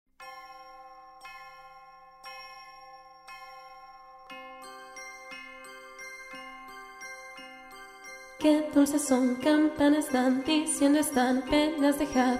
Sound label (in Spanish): Canto de las Campanas - Contralto